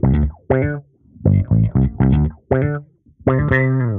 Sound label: Bass 120bpm, D, dusty funk